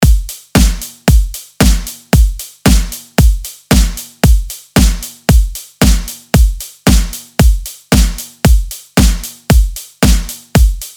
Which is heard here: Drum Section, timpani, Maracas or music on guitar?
Drum Section